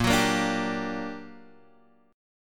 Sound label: G Minor Major 7th, Bb, guitar chord